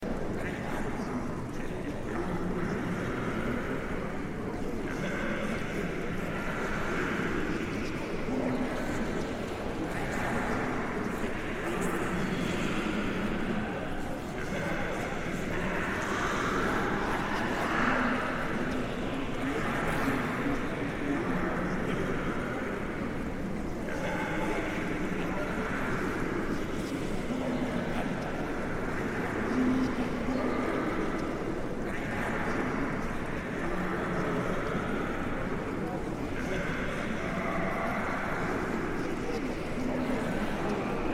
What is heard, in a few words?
Orcs Cave Amb; shieldwall chronicles swords of the north